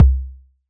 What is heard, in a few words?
Kick, Drums; Bitkits